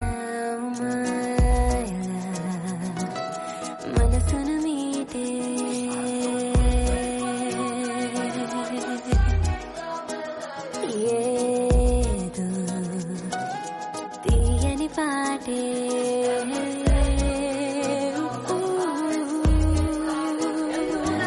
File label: english song tone ringtone